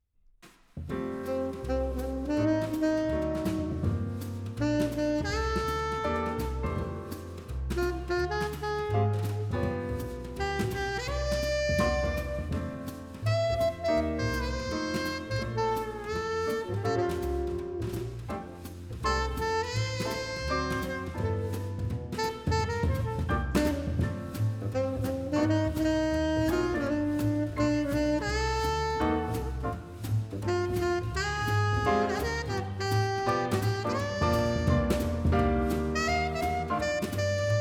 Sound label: Sony C 800 G, microphone; Das Mikrofon, Vol. 2; The History of the Condenser Microphone